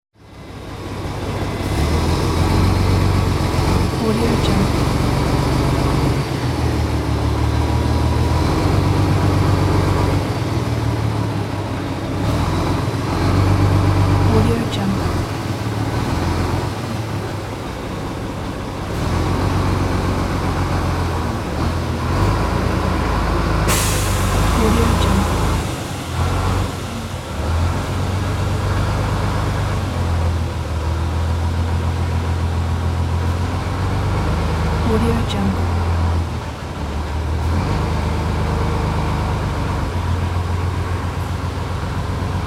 truck reversing

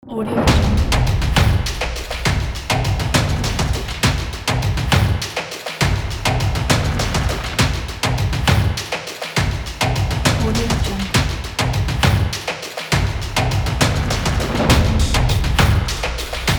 tribal; calmsound

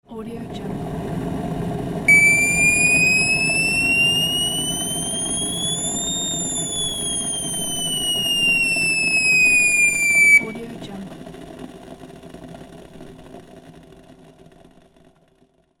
kettle whistling; creativetunes

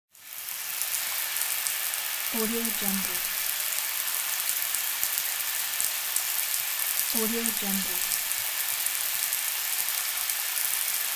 shower

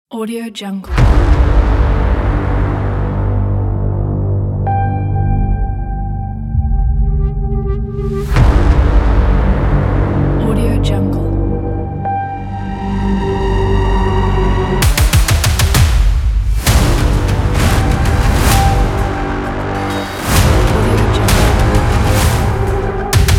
aggressive intro epic teaser

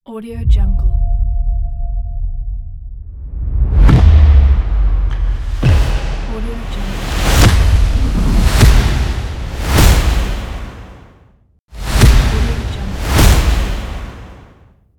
action cinematic logo; rocknstock

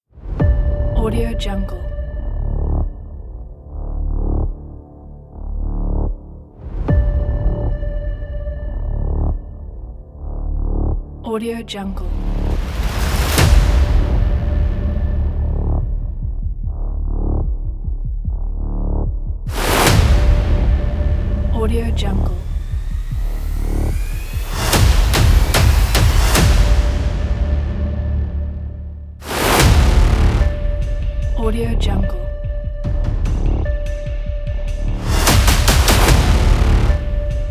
action trailer; soundbay

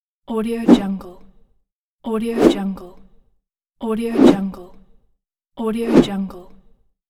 rope throw; sounddivision

